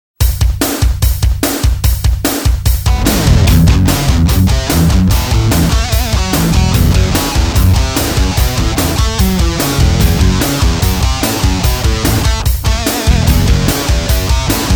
Driving Force; Soundzabound Volume 4 Disc 1

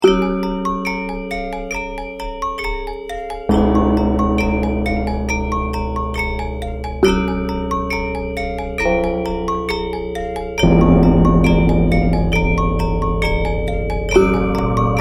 Walking Gamelon

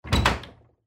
door open

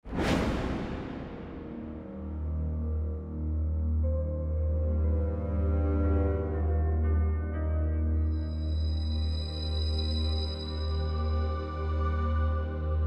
Eerie Musical

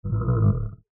dinosaur

